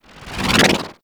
Golden Band Staff Expand; Warframe; Monkey King

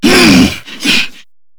Grineer Hammer Boss Grunt B; Warframe

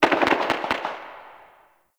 Distant Gunfire; Warframe; Ambience; NineteenNinetyNine

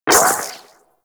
Shot, Auto Cross Bow; Warframe; Weapons; Tenno; Prime Ballistica

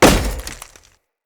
Great Sword Melee Impact Wood; Weapon Impacts; Player; Swords; Environment; Founders Great Sword

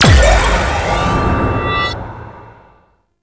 Heavy Slam Ability Charge Up; Warframe; Duviri; Dax Heavy; Abilities

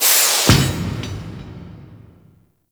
Organ Pipe Close; Ambience; Gameplay; Warframe; Orokin Moon

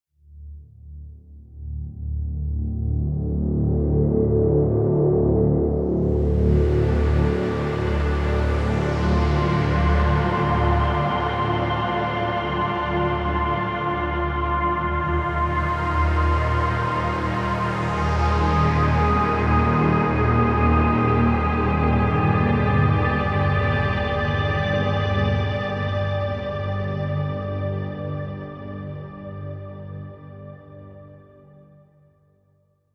Ambient Random; Railjack; Ambient One Offs

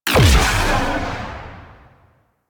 Crewship Missile Swarm Fire; Vehicles; Crew Ships; Weapons; Warframe; Rail Jack; Corpus Crew Ship

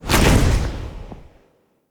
Staff Caster Cast Short Shot; Weapons; Player; Staves; Wazzard Staff Caster

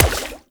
Water Sprayer Punch Layer; Warframe; Weapons

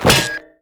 idle head impact; Warframe; Fortuna; Zuud

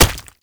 Step; Enemies; Steps; Proto Infested; Warframe; Brachiolyst

